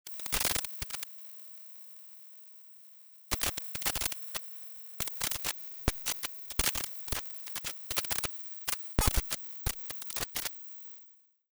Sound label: ROBOT BREAKING DOWN